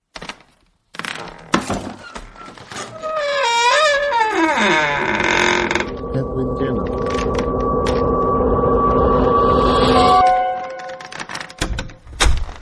Garage door opening sound effect

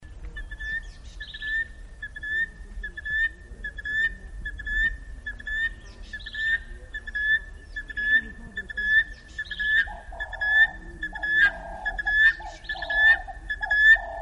New guinea bird sound

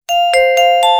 Notification bell ringing sound

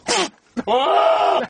goat scream sound effect

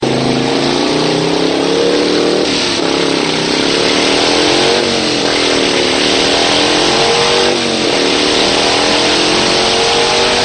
Harley davidson sound effects

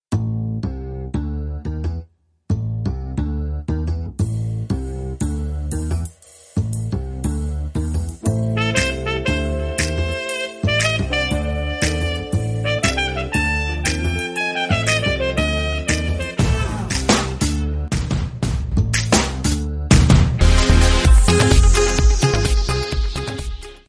simple funny

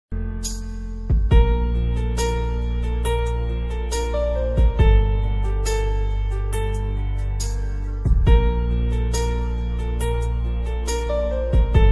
instrumental song

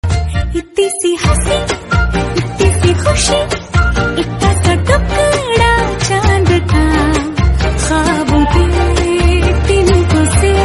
Hindi Song

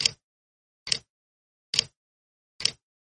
Ticking clock sound

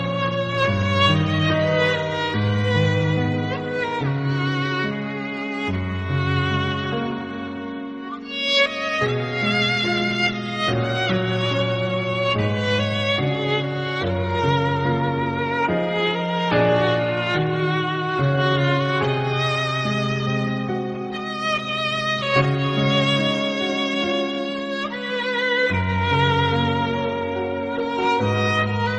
Sad violin; sound effect

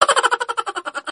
Funniest joke Laugh

